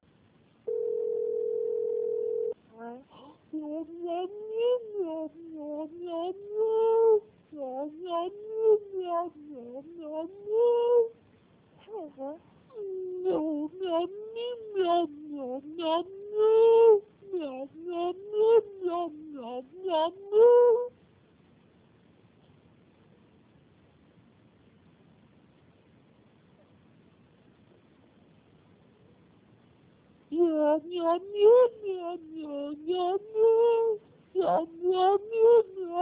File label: Meow